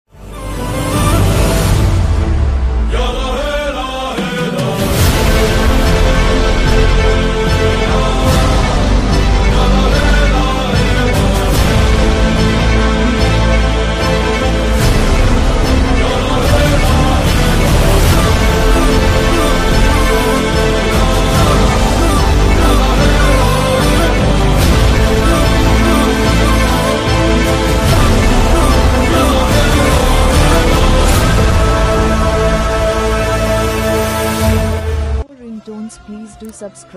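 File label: mass background music ringtone; kingdom movie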